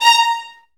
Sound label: violins; ILIO; Synclavier Strings